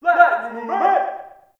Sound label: ambient campsite yells; Warframe; Tau